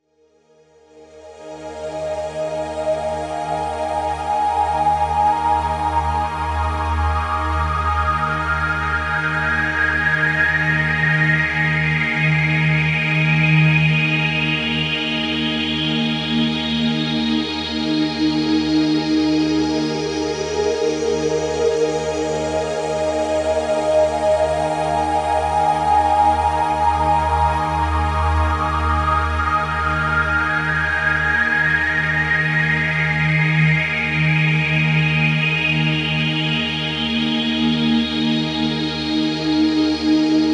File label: barberpole